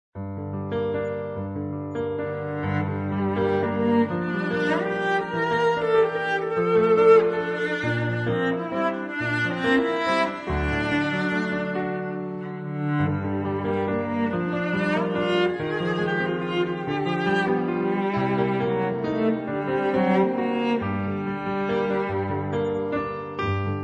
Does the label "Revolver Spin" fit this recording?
No